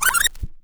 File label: back spin fast, vinyl record